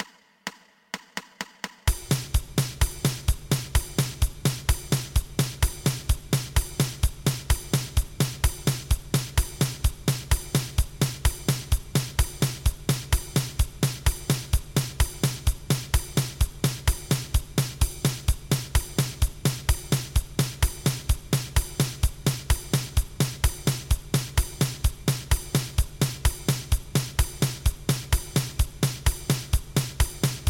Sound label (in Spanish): 2 pulsos